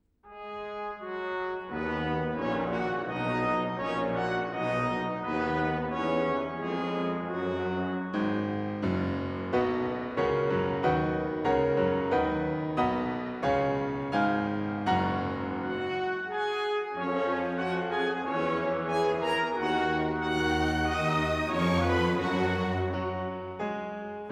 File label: Concerto for Piano and Orchestra; Mussorgsky; Pictures at an Exhibition; L Leonard; Geoffrey Simon